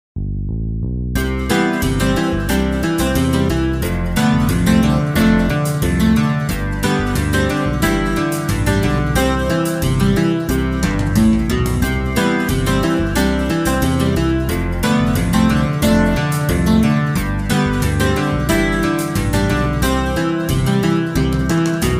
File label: PICKING; ASNIERES